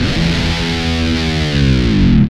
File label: GTR, E.Guitar FX; Roland LCDP02 Guitar and Bass